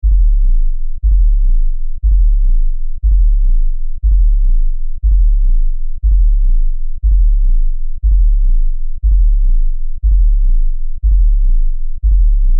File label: Low pumping throbbing; Deep Throbbing; Deep Tones; Sound Design